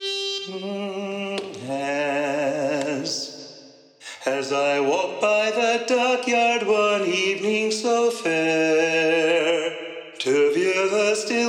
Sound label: Lead Predominant; sea shanties